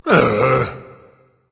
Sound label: ugh berserker; cuchulainn